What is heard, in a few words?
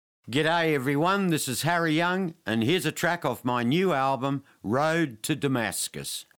STING